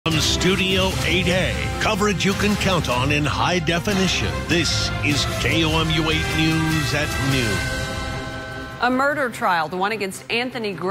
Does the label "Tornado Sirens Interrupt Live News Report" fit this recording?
Yes